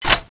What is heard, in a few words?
clip in; tfa cso